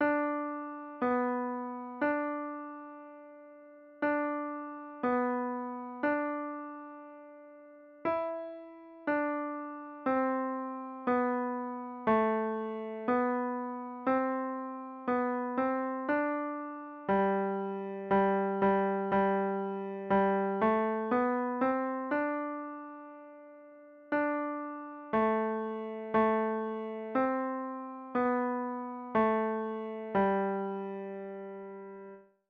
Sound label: this old man piano